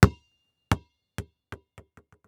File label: Sports Basketball Bounce To Stop